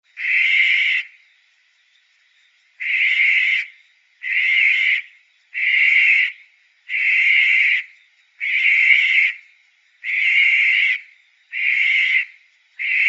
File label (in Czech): zvuk, ptaci